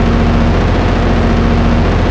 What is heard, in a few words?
ENGINE